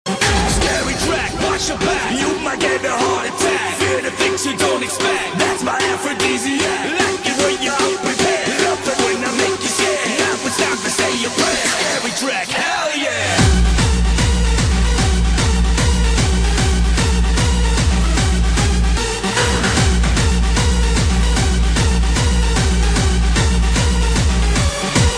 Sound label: scary track